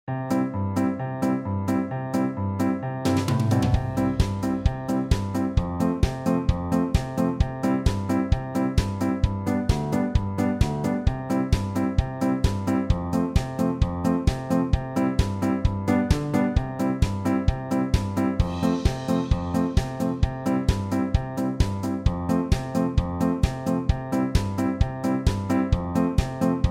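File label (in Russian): smeshnaia muzyka dlia fona bez slov, funny background music without words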